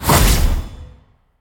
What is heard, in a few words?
arcane archer arcane explotion